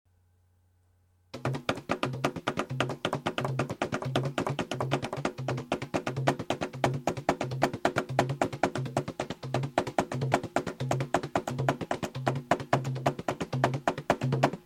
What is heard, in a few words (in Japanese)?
ミカカジ・パターン（太鼓合奏曲ムウォコロ）; africa